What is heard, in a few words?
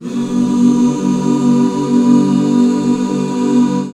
HAL G, BACK CHORDS, Roland; Sound & Vision - Gigapack II CD 1